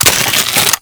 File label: Dart Pistol Reload; Warframe; Weapons; Infested